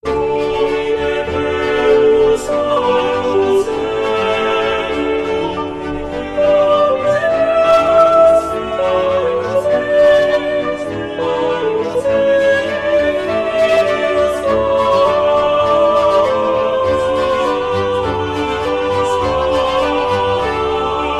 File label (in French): Gloria sop; Stage Zelenka 2023; zelenka zwv21